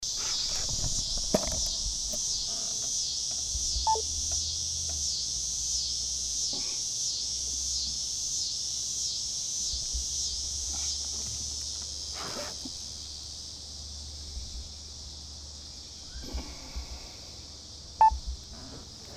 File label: cicada blues